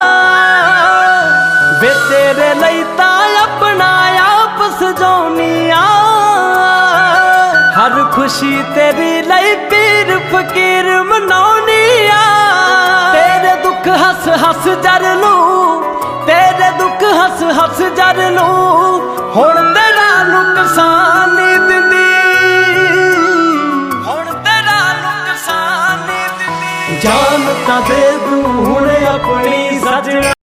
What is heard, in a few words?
Punjabi Beats Ringtone